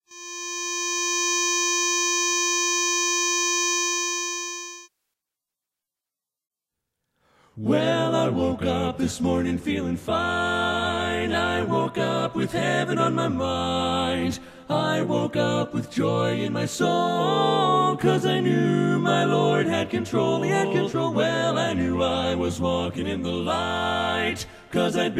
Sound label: Im Feeling Fine tenor, barbershop